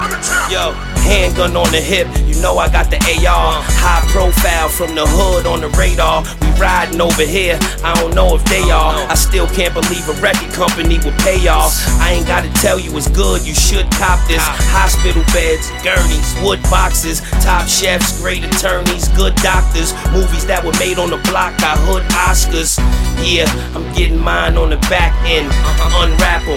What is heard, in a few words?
Kiss